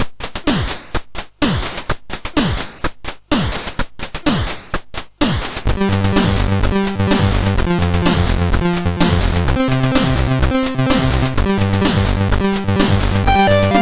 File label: Remix, MOS6581R3; Sanxion